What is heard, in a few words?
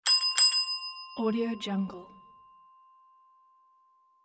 front desk bell double ring